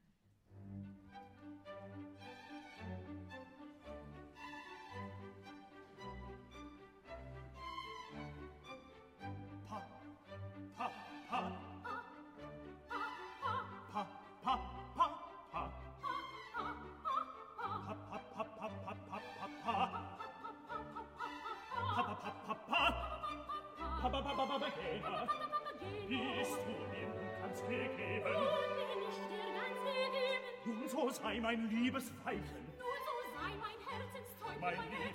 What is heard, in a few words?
duet